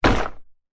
foley luggage bag rucksack set down items rattle inside; zapsplat